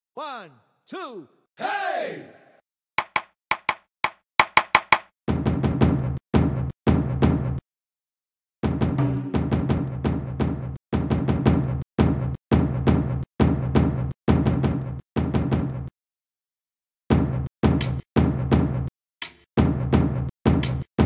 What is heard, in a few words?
drums 85bpm